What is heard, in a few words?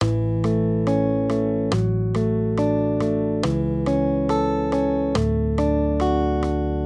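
Guitar, Accompaniment, Major Triads, A-D, 140 BPM; maj5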